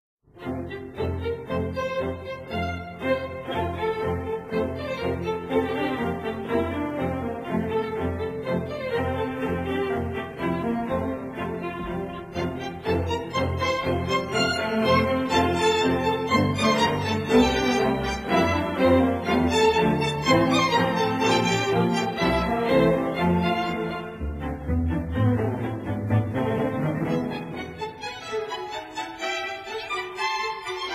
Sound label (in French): Airs Moraves Deciso; Tichy